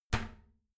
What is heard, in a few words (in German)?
toilet lid slam soft, badezimmer